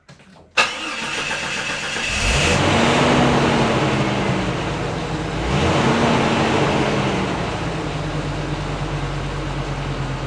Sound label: car start off